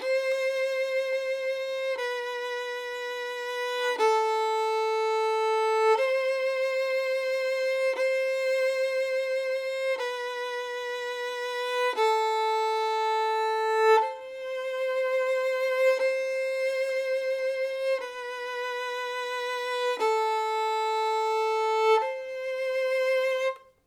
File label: violin